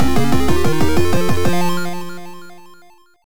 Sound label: Level Complete; Turrican 2; Atari ST